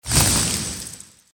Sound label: spell; Keep Out! A Dungeon Adventure for the Brave